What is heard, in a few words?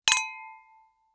glass clink; drinks